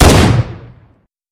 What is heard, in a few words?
magnum shoot; m6h2; weapons